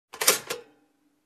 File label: Loose Button pressed down